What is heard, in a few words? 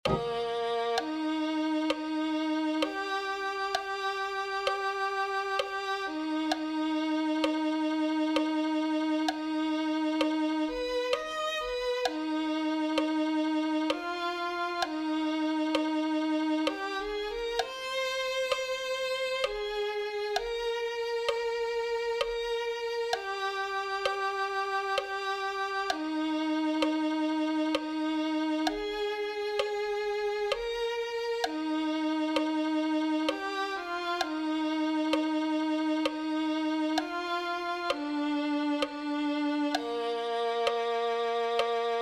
violin